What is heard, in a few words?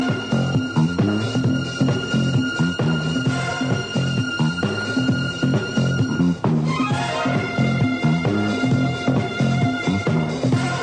chips theme loop